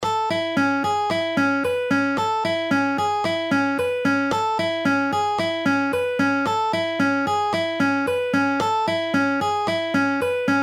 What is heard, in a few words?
Guitar Dynamics Half Speed